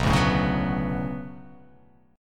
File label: B Minor 9th B, chord